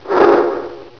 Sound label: Platform Dissolve; Bombzuka; Retro